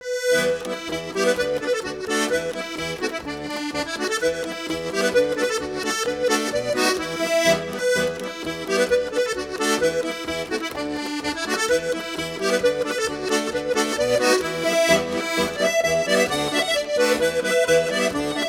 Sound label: Irish polka; Шедевры этнической музыки-Финляндия